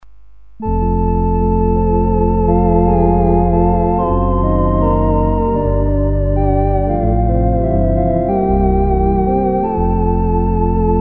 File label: O Little Town of Bethlehem, Organ